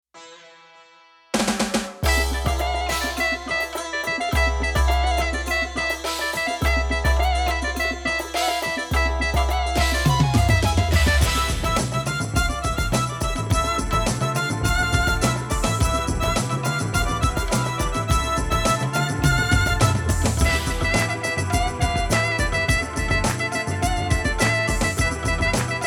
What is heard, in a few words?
Yamaha PSR 530; on-board demos